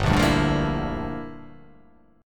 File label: G Minor 13th G down; Gm13 strummed; JGuitar